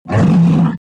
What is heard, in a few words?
Monster Roar